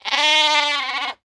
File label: sheep